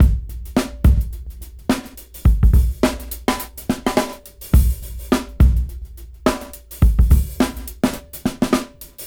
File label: Bumpy 106 BPM; AMG - Keith LeBlanc Phat Butt Jams